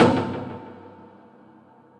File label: Metallism Synth, melodic arps; Ableton; Mood Reel